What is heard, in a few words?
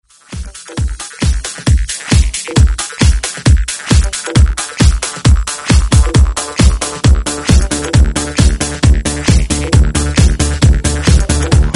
Disco Heat